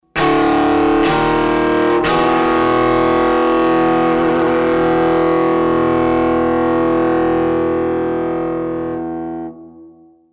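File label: Electric Guitar Overdrive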